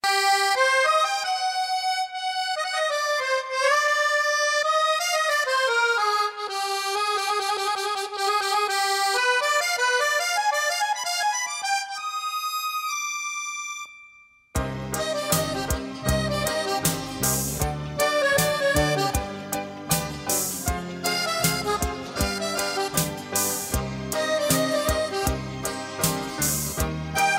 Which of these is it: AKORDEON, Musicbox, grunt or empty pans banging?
AKORDEON